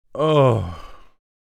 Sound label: Adult male pleasure moan